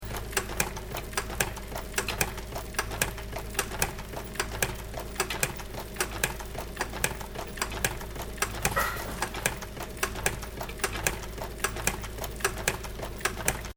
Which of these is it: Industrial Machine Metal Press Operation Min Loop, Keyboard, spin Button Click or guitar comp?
Industrial Machine Metal Press Operation Min Loop